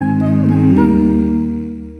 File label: windows is shutting down acapella